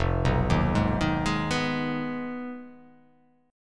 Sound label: F Minor 7th Flat 5th F down